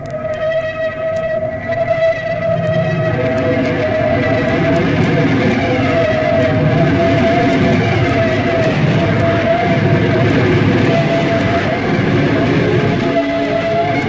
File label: distortion pad; Pads